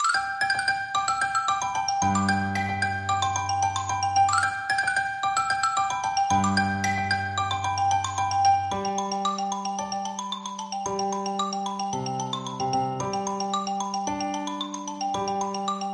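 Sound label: xylophone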